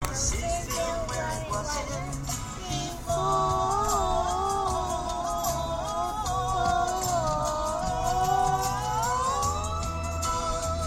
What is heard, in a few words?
singing in the car